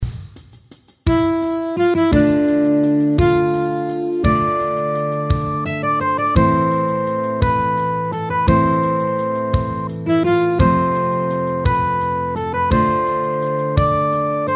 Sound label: Guitar